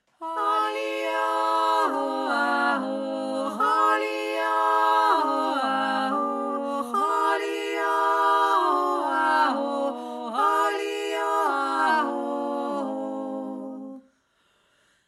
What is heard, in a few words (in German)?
Jodler, dreistimmig; Appenzell; ho li o ho a ho / holi o ho a ho / holi o ho a ho / ho li o a ho ho